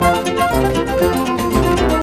mus cage forcefield; jungle world

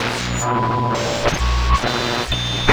Noise

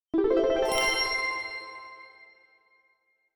ui avatar unlock melodic; Just Dance 2019

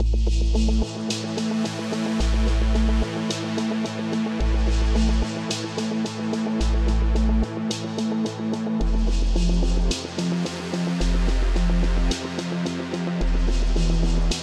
body + chords; One Dog Story

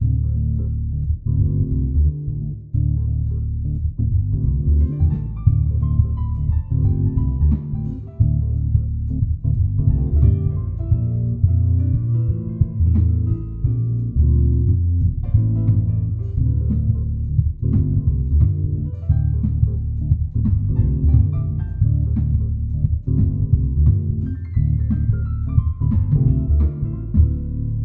Bar Music Low Frequency; Tales of the Neon Sea; iOS PS4 PS5 Switch Windows Xbox One Xbox Series X/S gamerip 2019

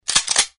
Power Up Shotgun; Acid Rising

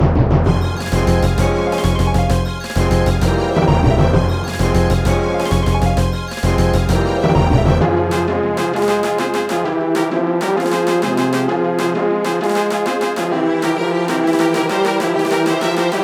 Boss Bossanova; donkey kong country 2 fan remastered

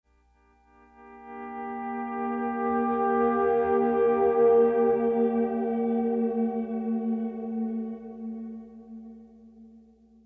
Pleasant Guitar

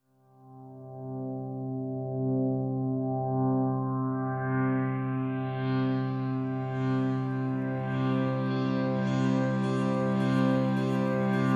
GARDEN MUSIC guitar soundscape; fujii ps4 windows gamerip 2019

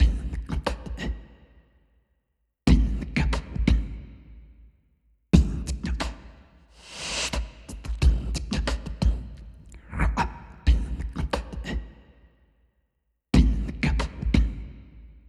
Dive Another Day (Beatbox); rayman legends the complete soundtrack gamerip 2013

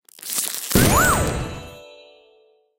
Login Gifts Explosion; Candy Crush Soda

